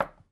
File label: tac